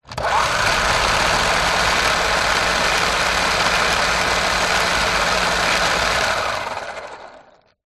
leisure hotwheels car track booster motor on run off